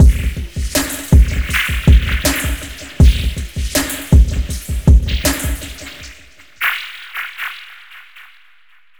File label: delays cave bioacoustics; triadizer; octo8r